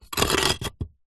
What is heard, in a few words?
foley concrete block movement on concrete ground; zapsplat